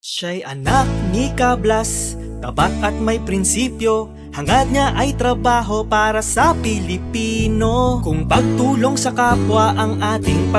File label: jingle; ople